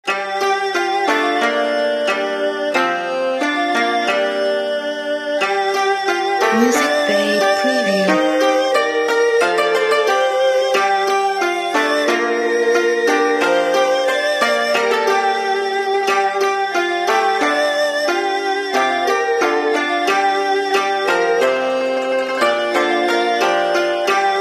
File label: Ancient China